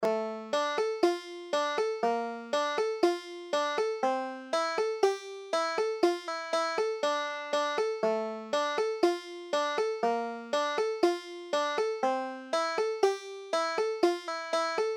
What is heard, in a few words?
banjo